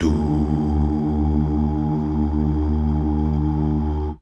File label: DUUH